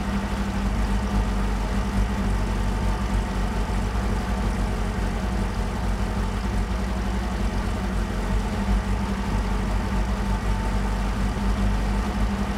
m4a3 engine rpm, engines tanks; War Thunder

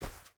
footstep rubber boots snow run; Rust

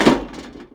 metal box impact

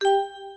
Aircrafts A-10 Cockpit Autopilot Engage; DCS

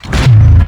charge

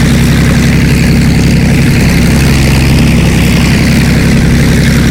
zlin side, engines; War Thunder

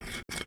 Inf Weapons Foley; Red Orchestra Ostfront 41-45

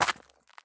jump glass; Escape from Tarkov

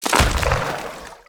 objects, World of Tanks

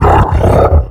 biomech vce hurt

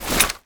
foley generic draw weapon

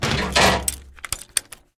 tross on, tanks

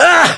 pain

